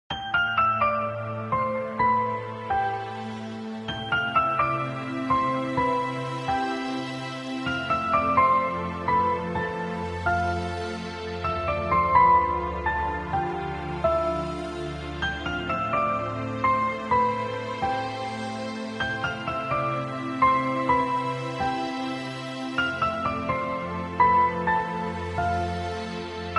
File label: Mobile Ringtone